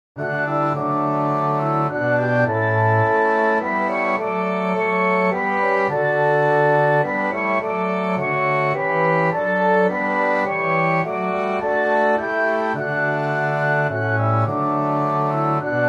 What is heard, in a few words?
Orchestra